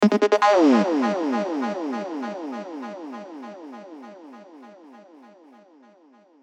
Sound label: psytrance mix